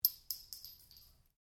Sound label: Cartridge 22 caliber short impact concrete, shell casings; shell casings of different calibers impacting on different surfaces